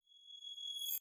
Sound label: Click